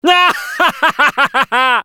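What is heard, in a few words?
laugh happy, French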